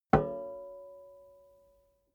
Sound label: plate hit ring slightly damped; hits impacts